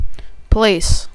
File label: police